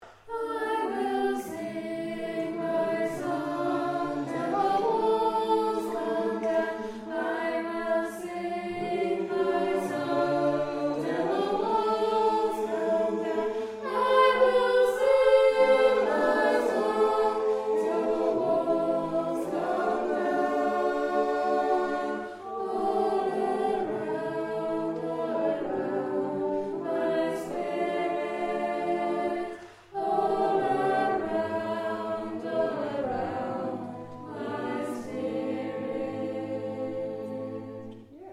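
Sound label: soprano, singers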